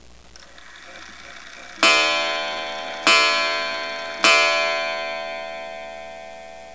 Ansonia East, Ansonia Gingerbread Clock (Eastlake Style); Gingerbread (kitchen) clock